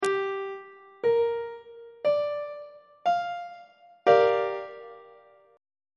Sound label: a sharp major 6th 3rd inversion; on treble clef